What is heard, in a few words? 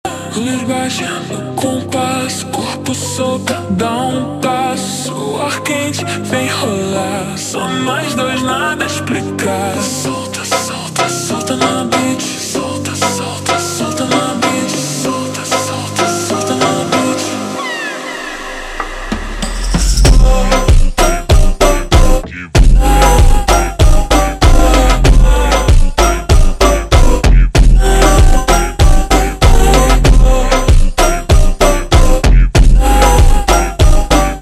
ultra Slowed, funk